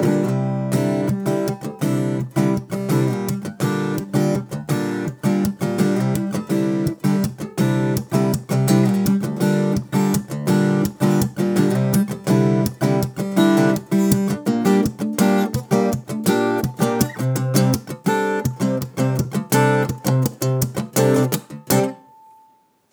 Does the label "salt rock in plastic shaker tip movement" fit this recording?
No